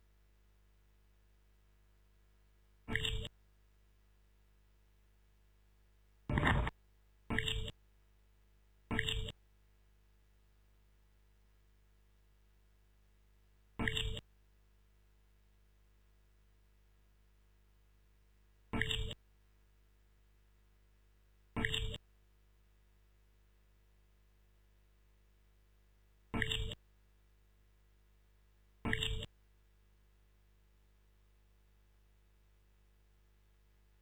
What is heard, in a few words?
stereo instr loop; rauschpartikel